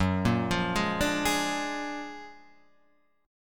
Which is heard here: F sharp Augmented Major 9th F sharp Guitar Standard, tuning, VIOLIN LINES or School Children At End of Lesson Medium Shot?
F sharp Augmented Major 9th F sharp Guitar Standard